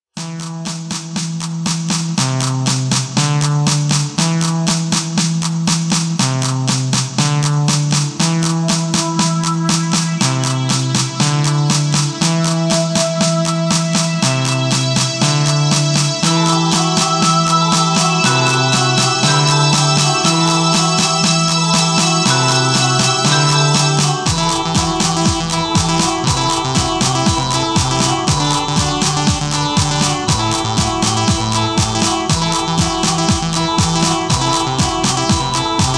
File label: Classic retro